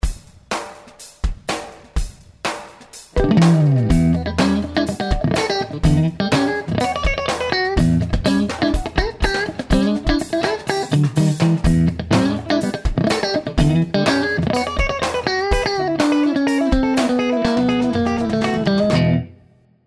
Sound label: funk; Funky Style; Clean